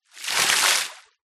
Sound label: nature water throw from plastic bucket onto deep water surface splash